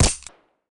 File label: pm silencer; STALZONE